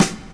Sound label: SD, dance drums hip